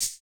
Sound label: hihat, house